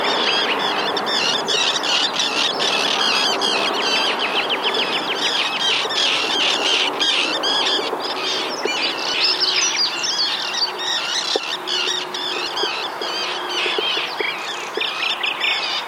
sternes rossignol grisette

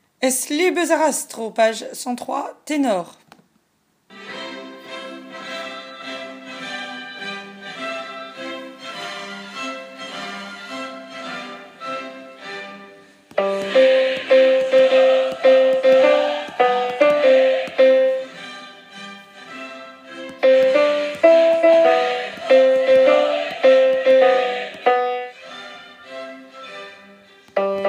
tenor

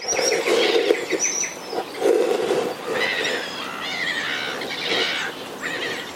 chevalier guignette

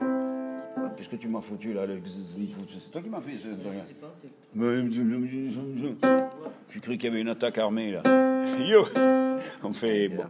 hommes

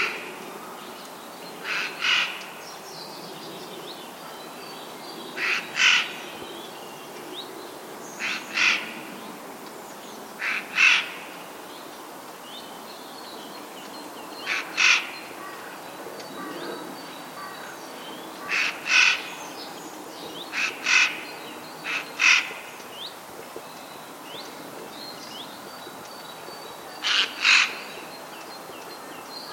geai des chenes etang

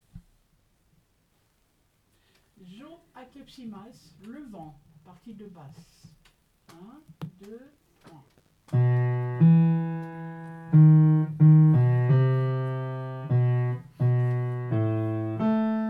le vent

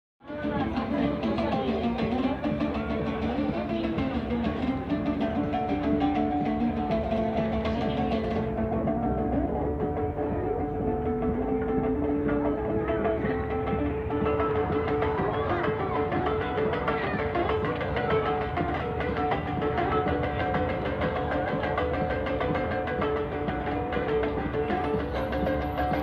militaire au dombra

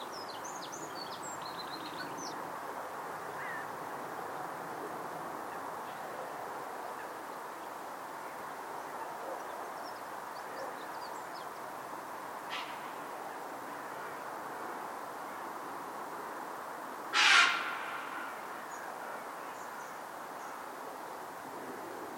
heron bouscarle

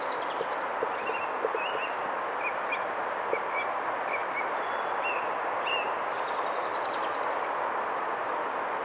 grive musicienne merle

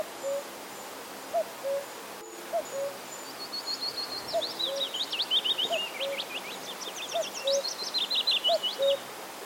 coucou pouillot fitis etang; precy

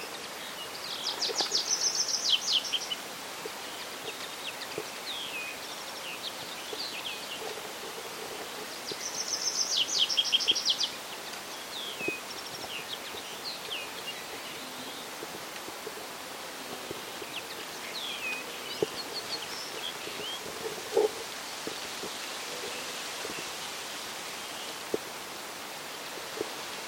pipit arbres linottes; lesigny

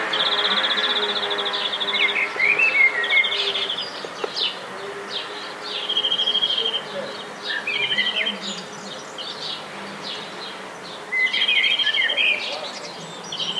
verdier d'europe

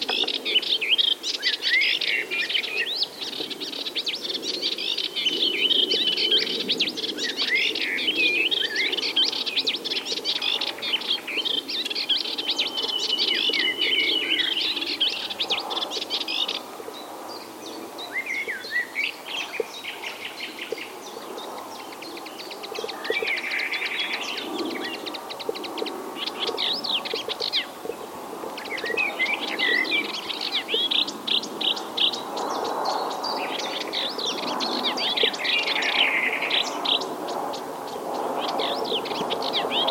hypolais; coubron

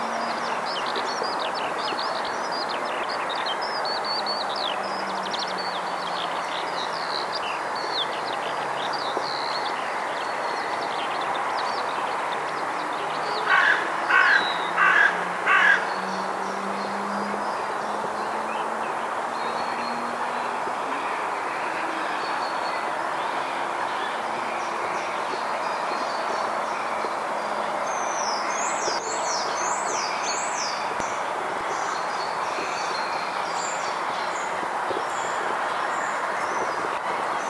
alouette étourneaux étang

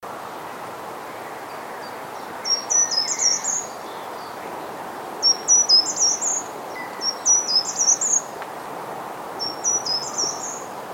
grimpereau

